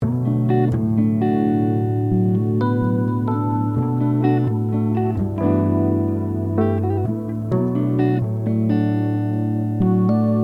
Lofi lounge

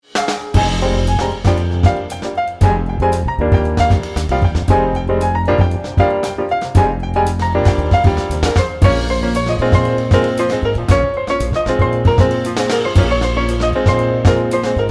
Red JAZZ Zone energy jazz

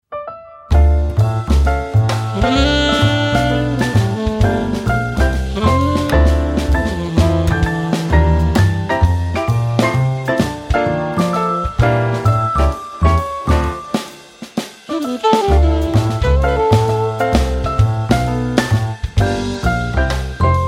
cozy jazz

